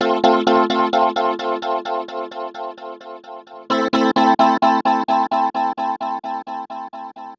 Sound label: D Gtr Quick Echoes; Zero-G; Future Wave